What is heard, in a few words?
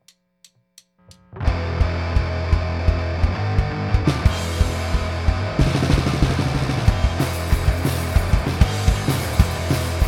with bass